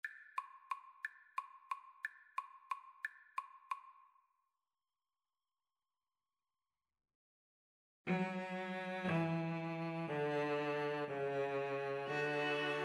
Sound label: carol of the bells, String trio